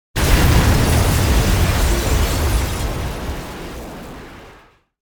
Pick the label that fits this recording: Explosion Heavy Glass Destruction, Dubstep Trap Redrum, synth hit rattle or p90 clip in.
Explosion Heavy Glass Destruction